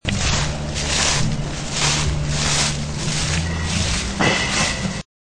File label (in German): laub